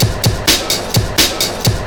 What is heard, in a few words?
drum loops; bb drum loops